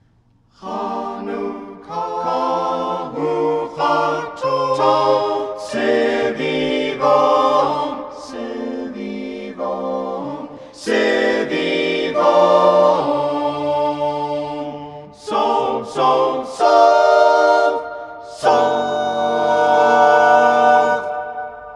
ending mix vocal

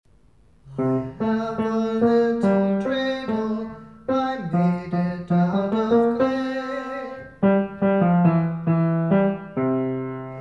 tenor; i have a little dreidel silly

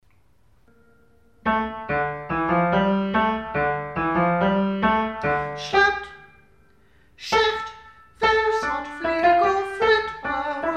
alto; dona dona

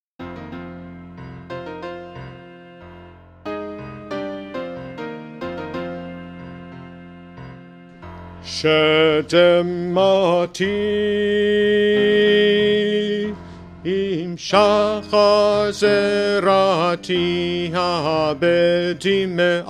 tenor; shedemati

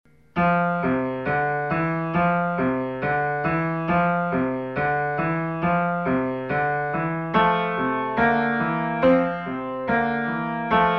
alto; gods gonna buil up zions wall

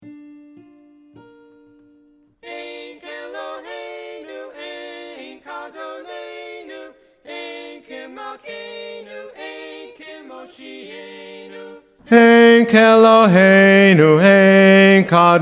bass; ein keloheinu

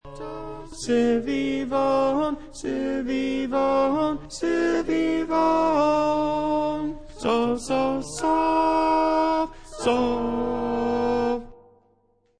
tenor, chorale

